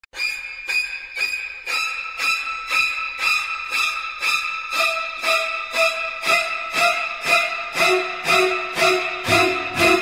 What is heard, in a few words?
Horror Tune Ringtone; ringtones